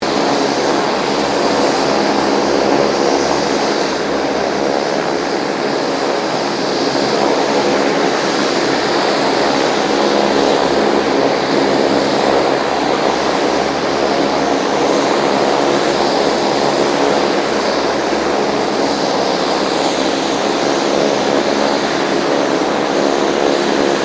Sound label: AIRCRAFT 2007 KING AIR 350 IDLE; Airplanes; Vehicles